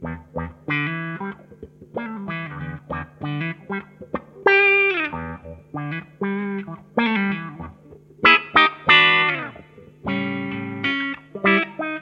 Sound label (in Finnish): strato kaula resonant